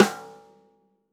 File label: snare snares on; JazzFunkKit